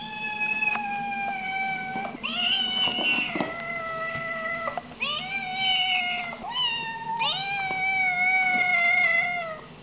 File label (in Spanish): siames